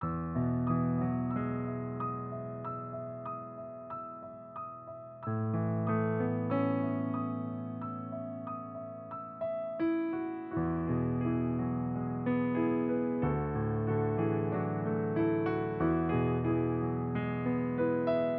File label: accomp